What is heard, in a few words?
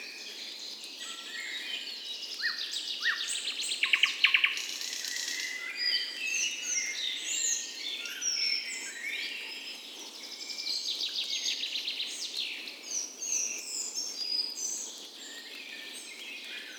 Forest Ambience birds